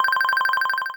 ringer standard

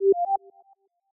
Charging Started; Sharp AQUOS sense4 lite SH-RM15; ui

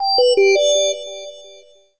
CallDisconnect; Samsung SGH-i310; Phone Sounds

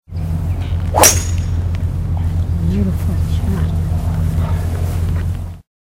sound startup, Golf World; Nokia; 6270 WAP

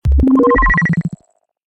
Alert; Samsung SGH-T839-Sidekick-4G

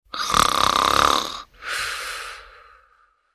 Snorer; Qiku; Luna Youth

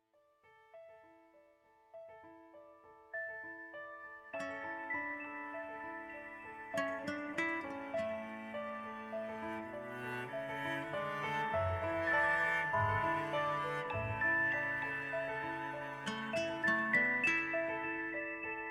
Gold Star, ringtones; LG; Wing 5G SKT

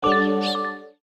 Tranquil open; Nokia; audio themes

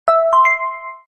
Medicine Alert; Pantech; P2020 Ease

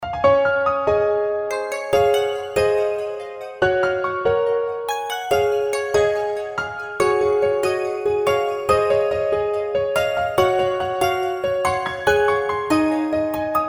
ringtong; Gionee V185

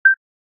Beep, ringtones; Nokia; X

